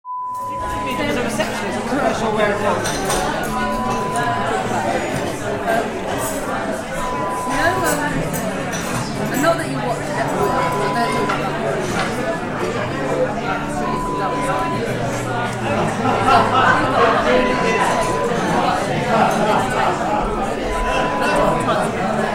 Restaurant sound

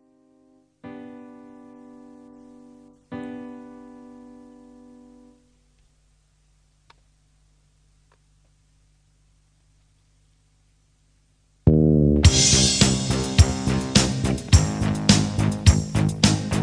karaoke minus one

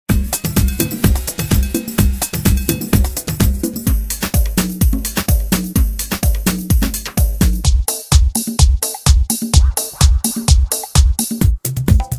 club vibes; bindle

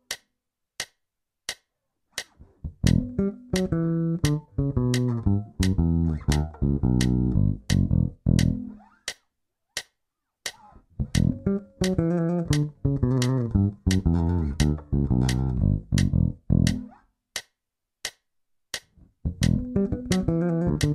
Bluesy descending bassline

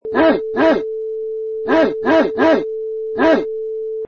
Huge Dog Barking

